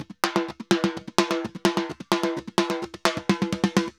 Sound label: TIMBA, SAMBA; World Grooves; DRUMS; Best Service ProSamples vol.14; AKAI